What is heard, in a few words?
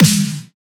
snare fit; Drum One Shots; SD MIDNIGHT Sample Pack; Slate